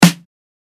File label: snare